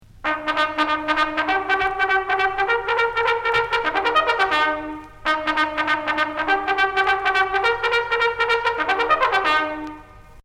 La charge (cavalerie), Sonneries règlementaires

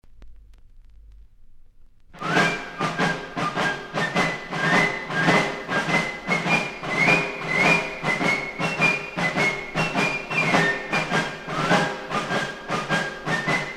Pas redoublé, musique militaire; Marches et refrains de l'armée française

